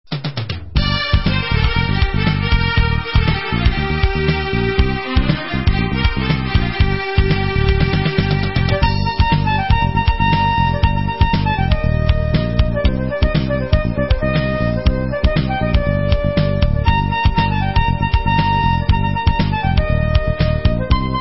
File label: Instrumental